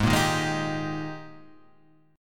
Ab Major 7th Suspended 2nd Ab Guitar Half Step Down